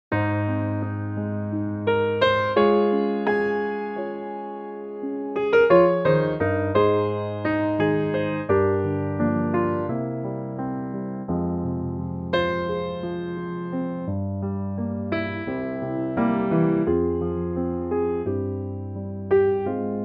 Ab piano; key - Ab - vocal range - Ab to C

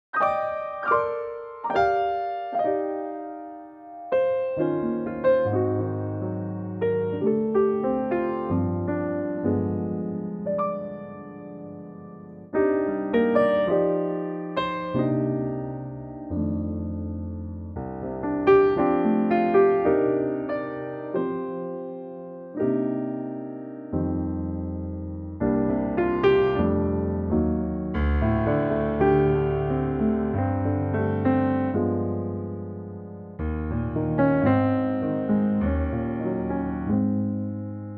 Bb piano; Pianotrak; key - Bb - vocal range - F to Ab

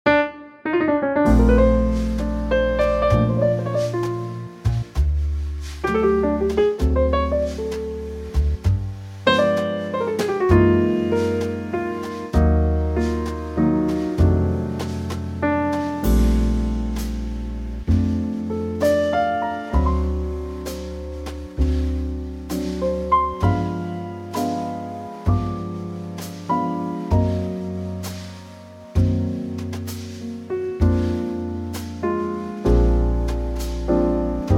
Bb Trio

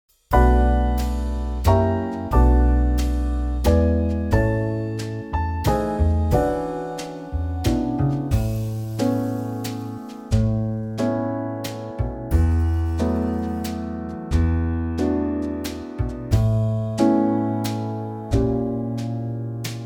A 90bpm; key - A - vocal range - D# to D

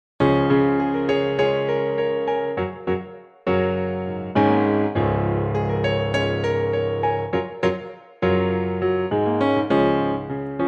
laughter piano

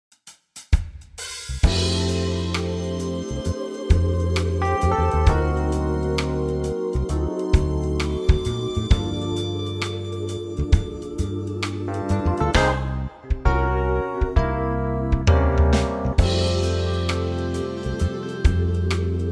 F to G smooth

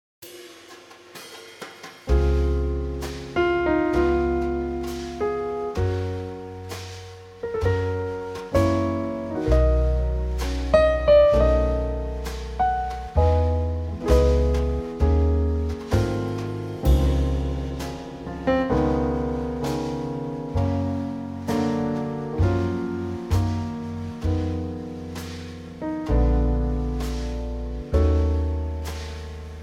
Db Trio

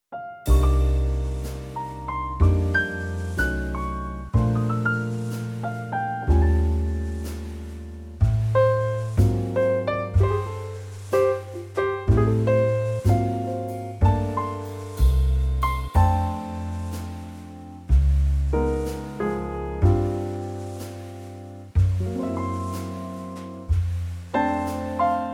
F ballad

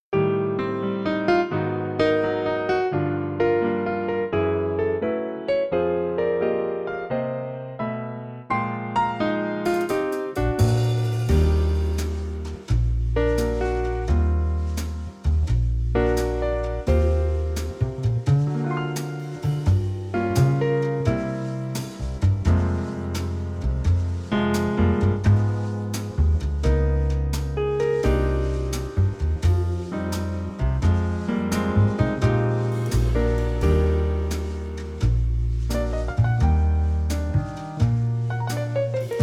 jazz

